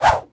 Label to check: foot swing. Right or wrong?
Right